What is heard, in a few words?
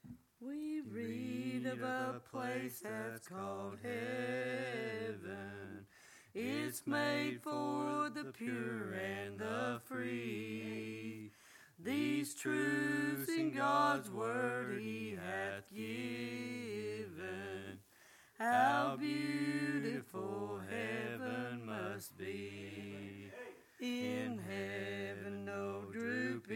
singing